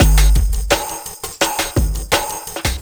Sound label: Inside Beat, Drum N Bass; Beats; Sonic Foundry (Sony Creative Software); Knutrix Drum & Bass